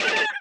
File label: shot; ZombieBattle